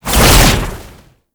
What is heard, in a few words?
Entangle Cast Whoosh; Warframe; Oraxia